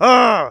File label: anger